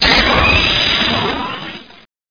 Air Pneumatic

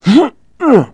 climb on; Messiah

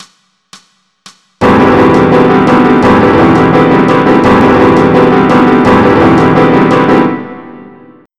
farruca; BUSKER L

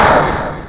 Pow

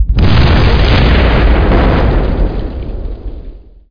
large explosion; SpecOps2MPlayerDemo

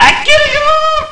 Shark Rampage!; Barrack 1.0.3

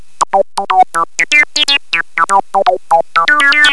sweep riff; techno; riffs; Terra Sound Library

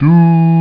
duuu low, instruments; OctaMED SoundStudio V1

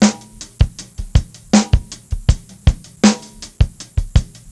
hip hop

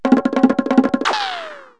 feet twng, cartoon twangs

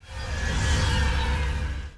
Restore Up; Lara Croft- Tomb Raider- The Cradle of Life

